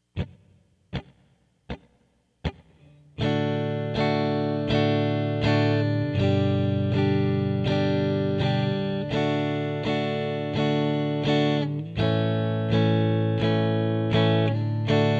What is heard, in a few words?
low e, guitar